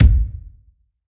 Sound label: kiks; drums single hits